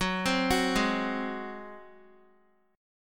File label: Gb Suspended 2nd Flat 5th Gb, down, Guitar; arpeggiated; 4E,4A,5D,5G,5C,6F